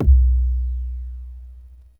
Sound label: POOF; NorthStar - The Wizard VOL-2